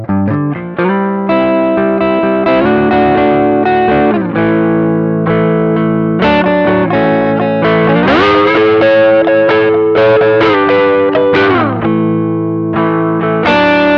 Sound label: Plexi; KPA